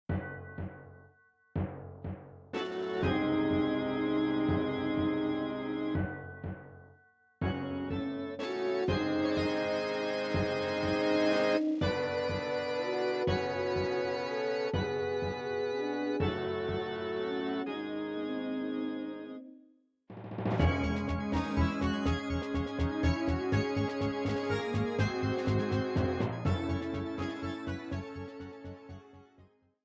Castle BGM; NSMB

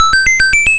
No Up; SMM; SMB

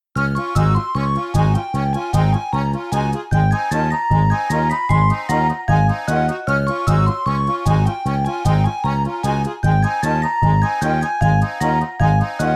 Musical Snares

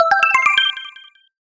1-Up Multiplayer; NSMBW

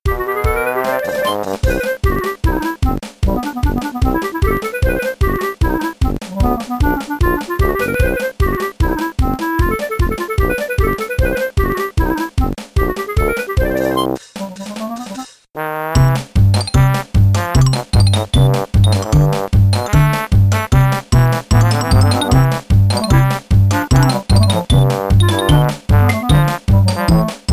three snow tune

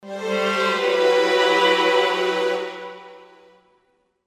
Sad Moment; LM3

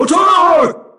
Attack; Dr Crygor

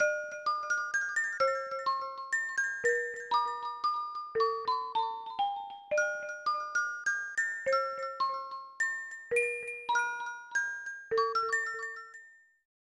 Game Over, Game Over theme; DKC3

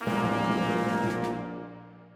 Mini Fanfare of Revival

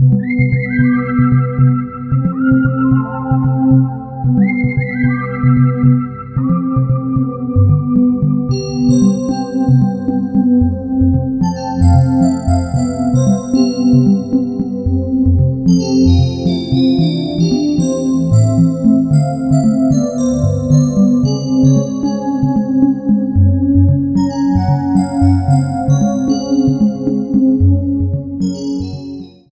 Underwater; SMM2; SM3DW

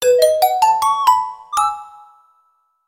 Game Over jingle

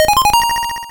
Red Coin; SMBDX

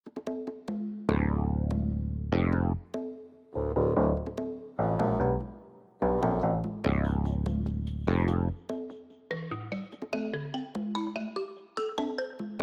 Breezy Tunnel

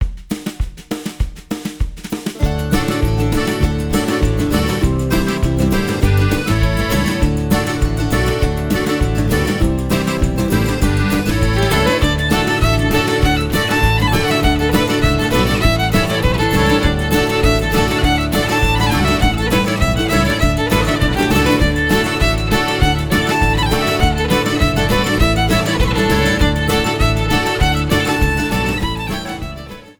Arrangement; Puzzle Plank Galaxy

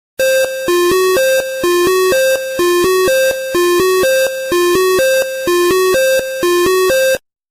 Fire Miss, miss jingle

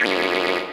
Horn; MK8; Wario

